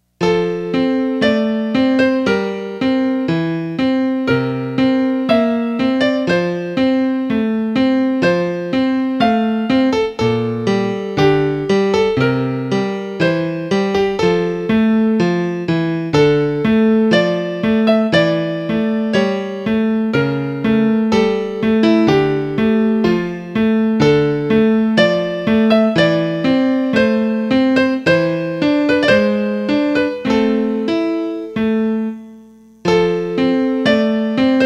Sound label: Piano; Piano Musical